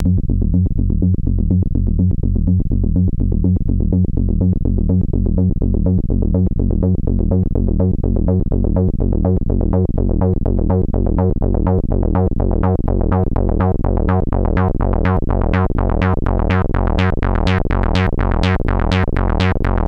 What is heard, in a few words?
Groovy electro, techno acid